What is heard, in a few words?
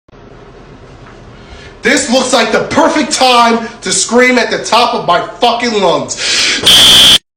stupid goofy ahhhh sounds